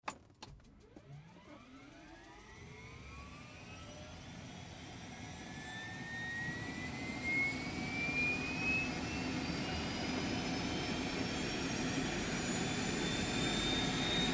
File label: Helicopter Startup Lift Off Hover; Helicopter engine startup, rotors start to move, torque, accelerate, lift